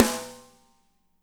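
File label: medium snare, natural kit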